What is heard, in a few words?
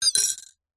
household teaspoon set down in mug of hot tea or coffee